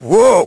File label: ice slip; Crypt of the NecroDancer